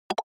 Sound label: Percussive Accent High